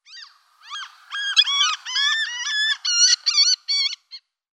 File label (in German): Praeriemoewe Larus pipixcan